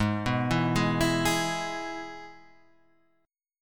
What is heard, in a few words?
G sharp Minor Sharp 5th; x,x,6,4,4,3,6,5